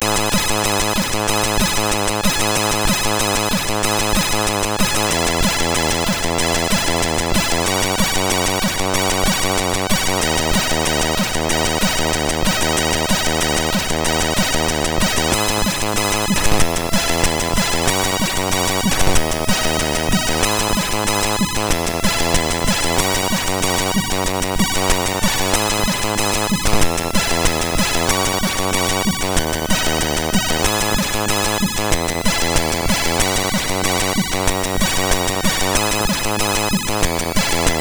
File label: Metalstil, MOS6581R4